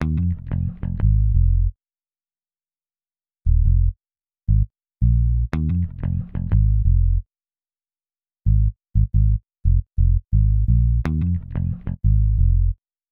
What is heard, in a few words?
Electric Bass